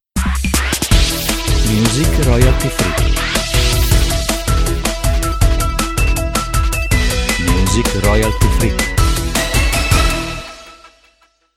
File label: Electronic